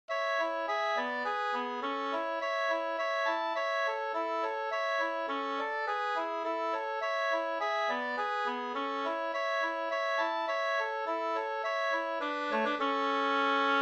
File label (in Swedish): slangpolska